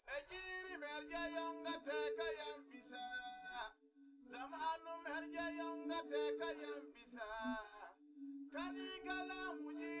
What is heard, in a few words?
test nodrums liverec